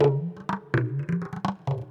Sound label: PERC LOOP ETHNO 125; eJay